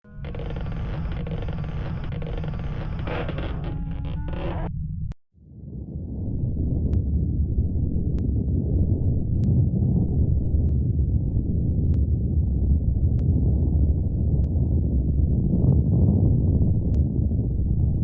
grow

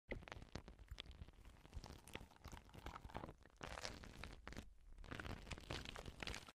sticky; چسبناک

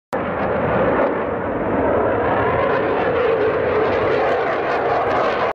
Rafale; جنگنده; رافال

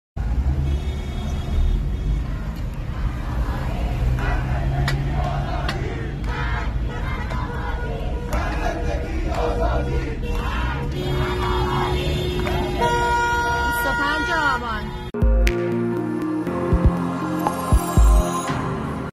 Woman Life Freedom, شعار زن زندگی آزادی

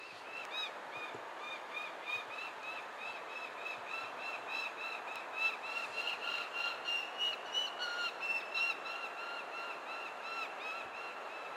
Lanner-falcon, پرنده لاچين

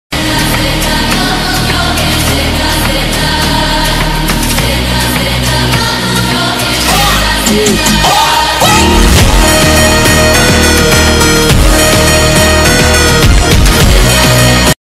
Funk Ringtone

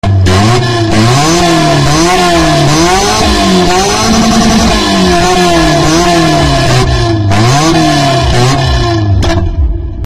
exhaust 220

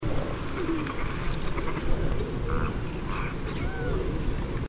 Darter

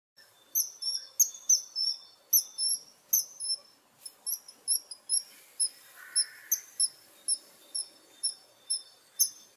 Kingfishers; ماهی خورک

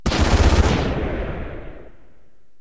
Seed Spray Sound Effect; Final Fantasy XI

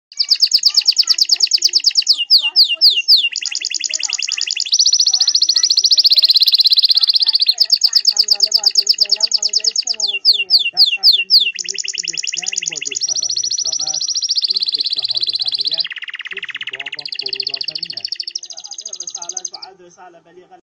male canary

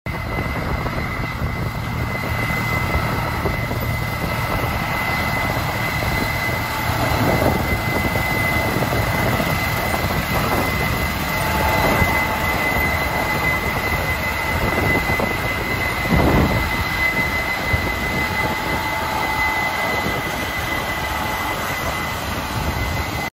Combine

